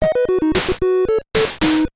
Balloon Fight Finished Level; animal crossing full gamerip

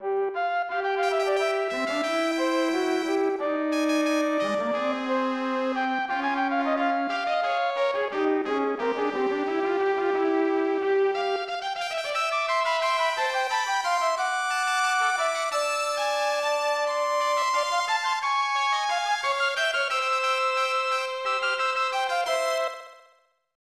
Orchestra Concert; lennus 2; snes

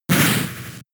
Hail; Pokemon; Gen 3; attack moves; RSE; turn damage

